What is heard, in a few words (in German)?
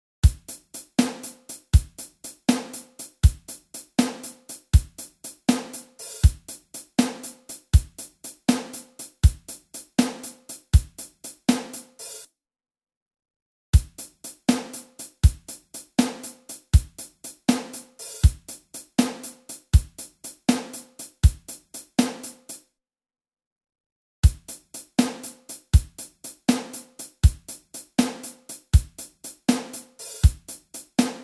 pulstraining 12 achtel 80